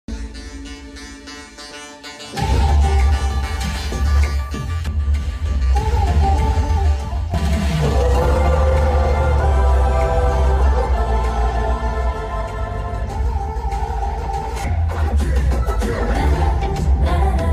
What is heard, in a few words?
hero entry bgm ringtone; Family Star movie